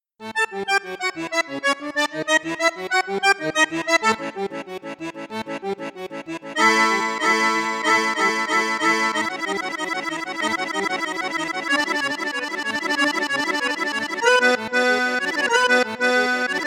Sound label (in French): registre basson flute juste flute haute, accordeon; savoia cassotto ii